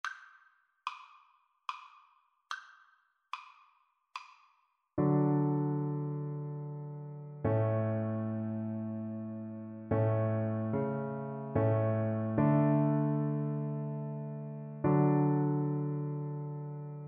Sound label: cello